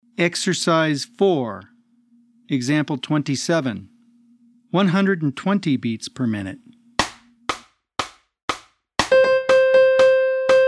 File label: 120 BPM